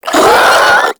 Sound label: Infested Charger Pain, Enemies; Warframe